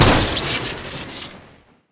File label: snipertommy shoot crit; tf2classic